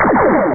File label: laser; Angst; Amiga